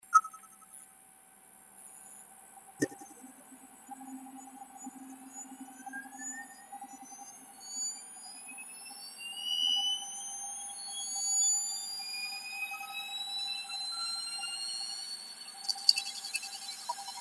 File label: startup, hdd; seagate barracuda 400GB noise